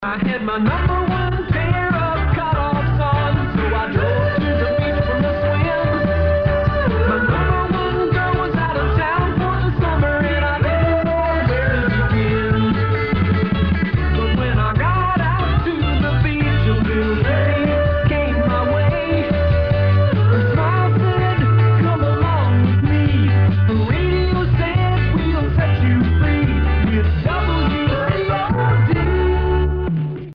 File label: jingle; WCOD; cutoffs; 1976